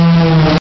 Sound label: AIRPLANE, clicks